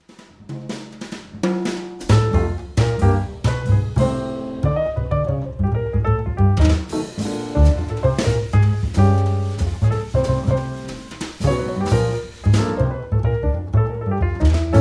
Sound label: Doghouse Blues